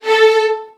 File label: A2, Strings, VN 16TH; ILIO; Kirk Hunter